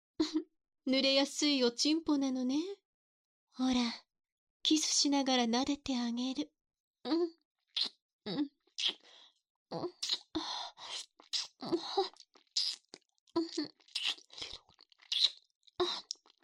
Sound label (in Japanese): 激しくフェラされ続ける音声, ASMR